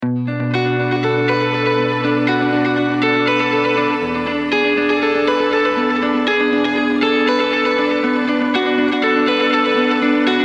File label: shimmer, backings